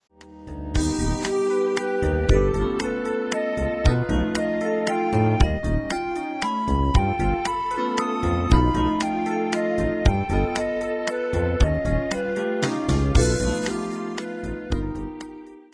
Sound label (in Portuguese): triade aumentada